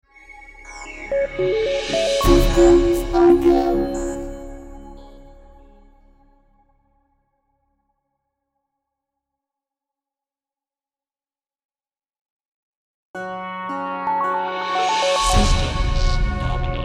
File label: Vocoder Intros